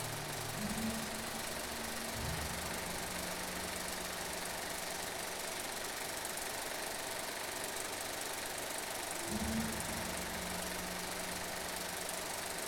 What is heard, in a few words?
Old projector w soundscape